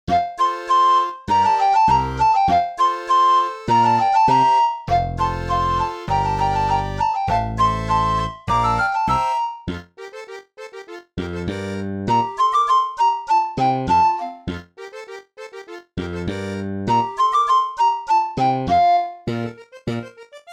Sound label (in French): fanfare; les grandes landes